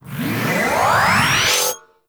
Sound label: Railgun Charge